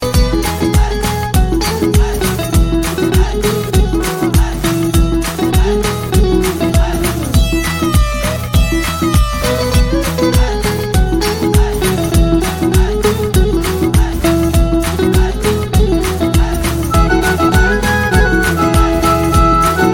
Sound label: guitar ringtone